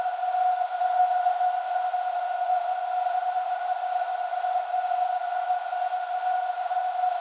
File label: Noise, 133BPM, Dark Analog